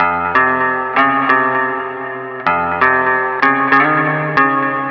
guitar

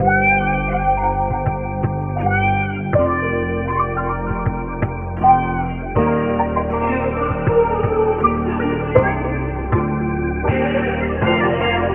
guitar voice, Loops; Phonky

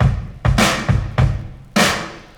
big beat, Drum loops, Boombap

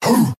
"hoo" group of men, voice; ooh ahh; ho hoo woo

